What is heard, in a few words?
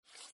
slide, backgammon